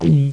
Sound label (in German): schluken; CD-ROM Magazin 1996 September